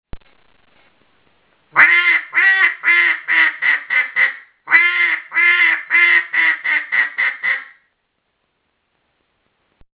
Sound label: Pleading Call